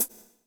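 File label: hi-hat, drums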